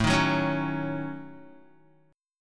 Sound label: A 6th Flat 5th, Guitar; A6b5; strummed; JGuitar